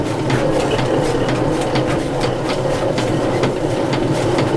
Vehicle Weapons; Red Orchestra 41-45

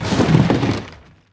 estate furniture slide; Modern Warfare

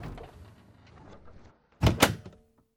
Aircrafts Su-25 Canopy Close; DCS

